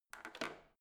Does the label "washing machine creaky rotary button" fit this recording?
Yes